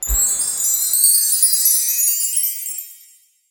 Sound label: Radar Spread Sound; Jolly Battle; Android